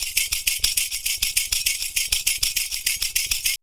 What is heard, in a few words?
PERCU; Univers Sons; Raricussions